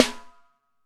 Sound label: Snare, Alesis DM5 Drum Machines